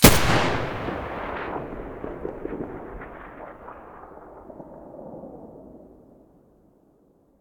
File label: muzzle g36 mono, weapons